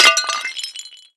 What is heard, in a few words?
glass pottery large break; physics